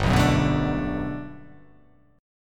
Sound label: G Major 9th, G, down